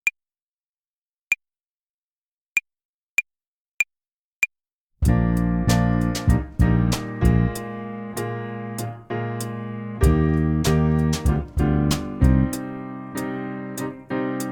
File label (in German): tempo und rhythmus uebung hihat play along